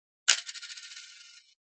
sound of coins dropping; winchester; century ghost

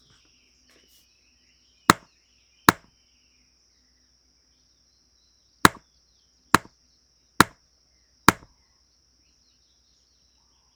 ghost radio taps; winchester

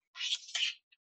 sound of metal sliding; winchester; century ghost

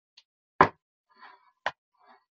sound of cup moving; winchester; century ghost

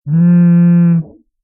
ringing

